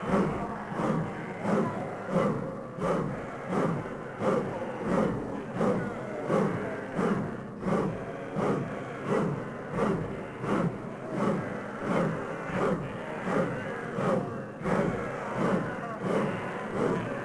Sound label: arena ambience; tusken; Star Wars Obi-Wan; XBOX